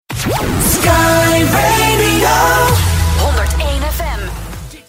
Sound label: radio jingle; sky radio 2014